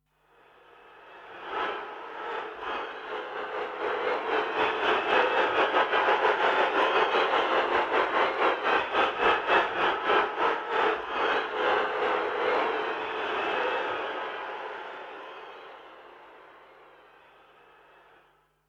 shimmer

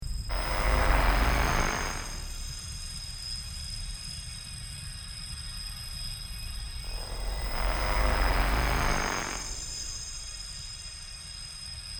Rolling glass

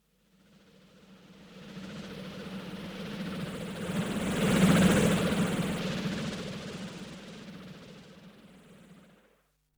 snare mist

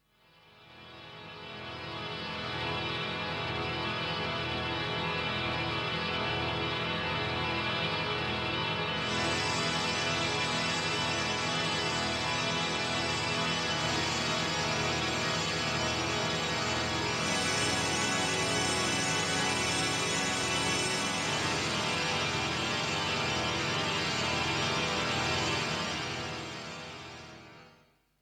tonal build

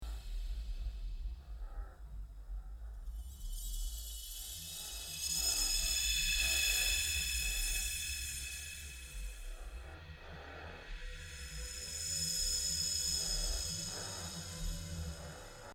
distant animal cries

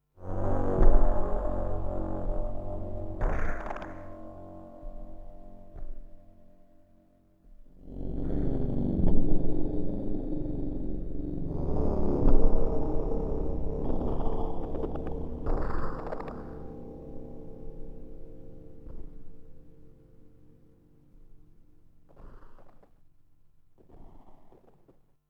jug wind

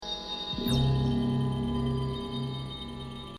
strum

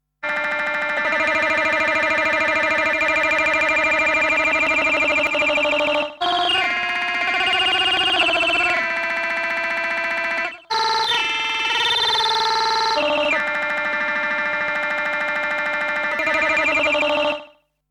close piano tinkle

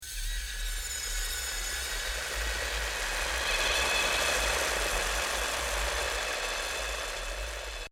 snare mist

